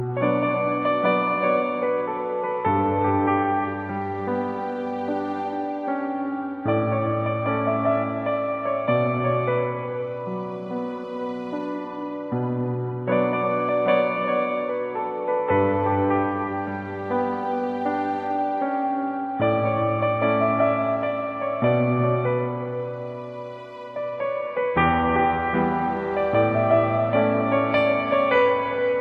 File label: Paino